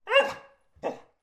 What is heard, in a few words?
animal dog labrador yelp